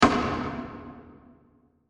Bursting Wood Impact With Large Reverb; Impacts; Bursts

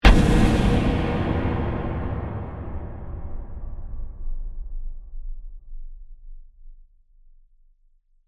Hit Dark Reverb, Horror; Production Element, Imaging Element, Accent, Transition

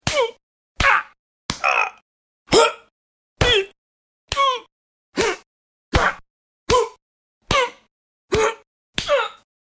Punches, Punches with vocal sounds.; Punches and Hits; Combat

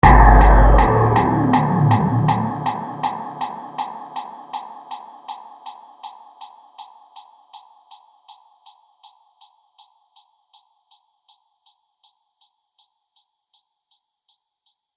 Futuristic Destruction Impact; Ultimate Power

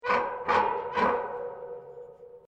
METAL CREAK LARGE; Materials

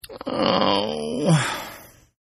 Groan Male; Groaning; Voice

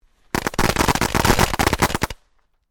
FIREWORKS CRACKER SMALL CLUSTER; Variety of fireworks being detonated

